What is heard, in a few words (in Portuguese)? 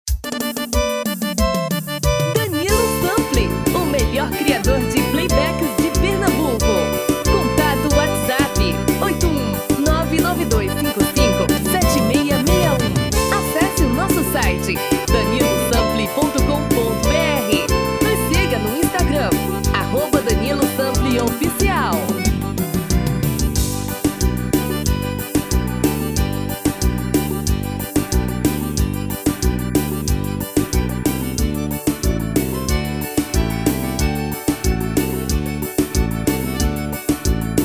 FORRO